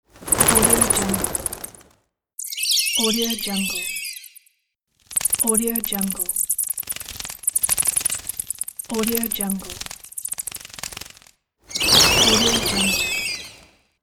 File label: bats for halloween